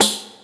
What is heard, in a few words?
Orch perc; musicradar; cartoon caper